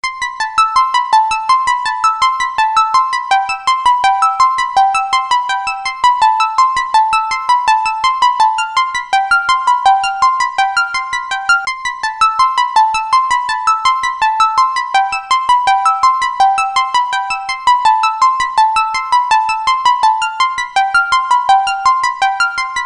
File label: electric harp